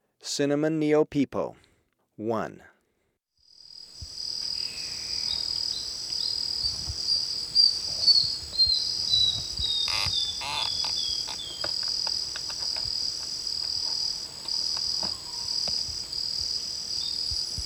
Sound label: Cinnamon Neopipo (Cinnamon Tyrant-Manakin); S. America